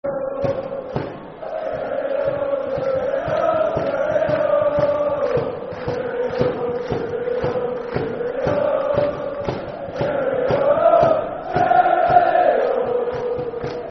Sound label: Cheerio, Football Chant (Soccer Song), N. Ireland